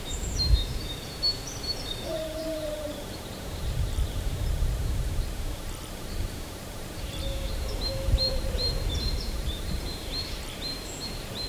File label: village forest nearby no, birdsong